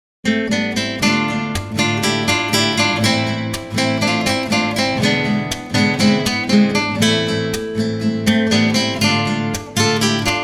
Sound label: takirari del regreso, 3 Gitarren; traditional